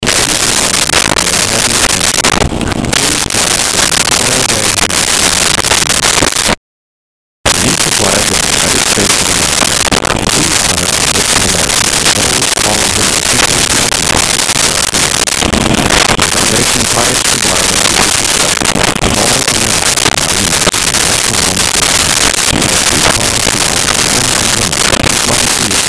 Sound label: taps with